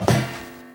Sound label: metal grate; portal 2